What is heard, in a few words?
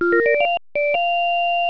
charge; alertViz